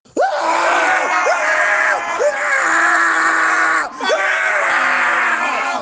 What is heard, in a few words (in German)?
schrei; ASC Combines